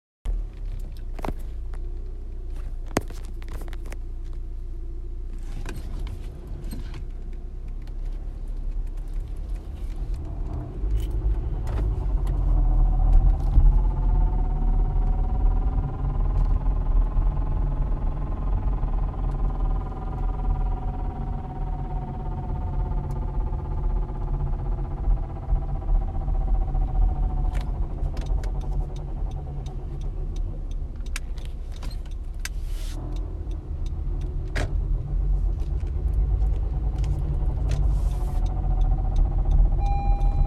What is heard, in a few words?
gurgling sound transmission civic